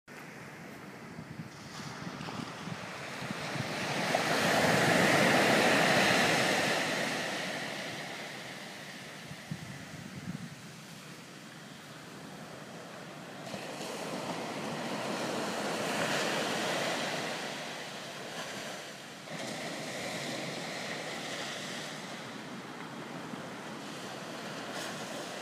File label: Ocean